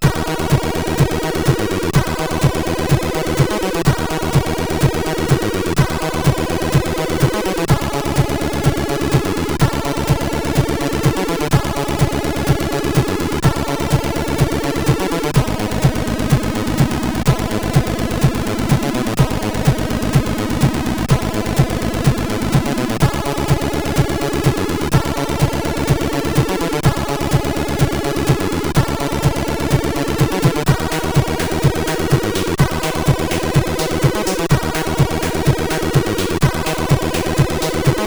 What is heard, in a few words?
Techno, CSG8580R5